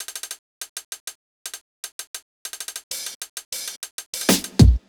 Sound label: Drums, 098 Bmin, Hip Hop Exotica; Apple Loops; Big Fish Audio